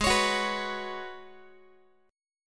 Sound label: G sharp Minor 11th G sharp Guitar, chord; G#m11 strummed